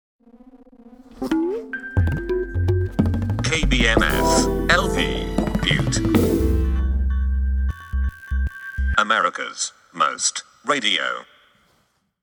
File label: Tone Tone; STATION IDs